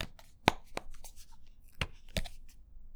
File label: Bottle Shake